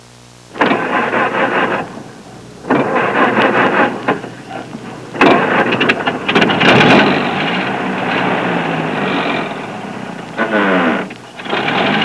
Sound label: FORD, car sounds